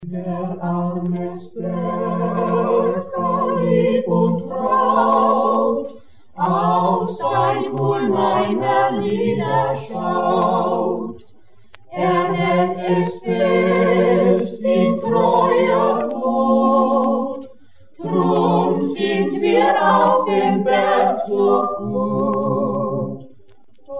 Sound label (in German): chor